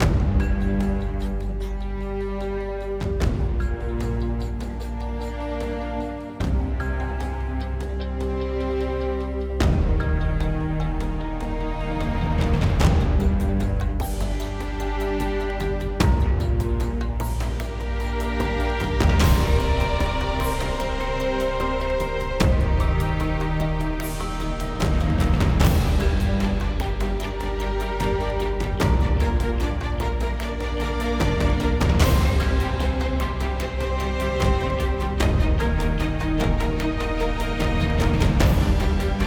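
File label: Music Percussion, Percussion Post Race 75bpm; Forza Motorsport 6 Apex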